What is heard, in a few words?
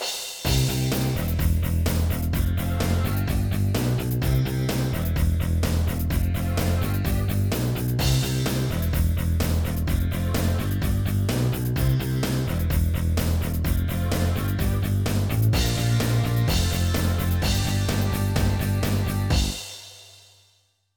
BGM Homerun; World Series Baseball 2K1; Dreamcast